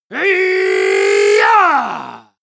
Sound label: male combat attack long